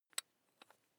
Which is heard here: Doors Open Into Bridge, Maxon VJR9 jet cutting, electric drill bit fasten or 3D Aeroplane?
electric drill bit fasten